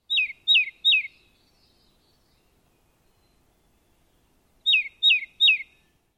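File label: crested tit voice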